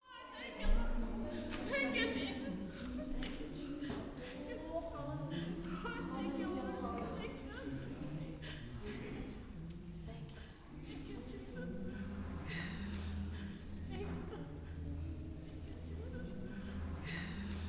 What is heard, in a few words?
WORSHIP, WORSHIPAMB, Vocal Planet, Gospel